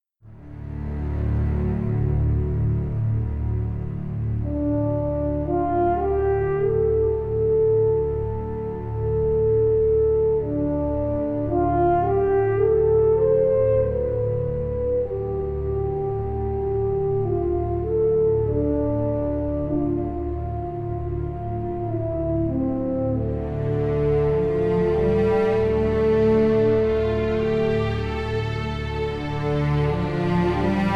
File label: legato lament legato; legato demo